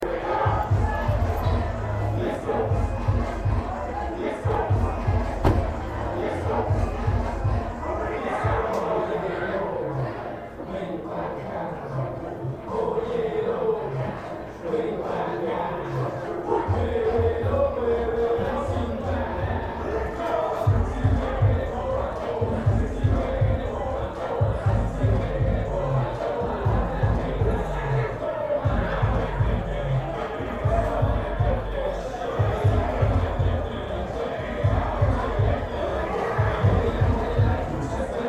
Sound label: stripclub ambience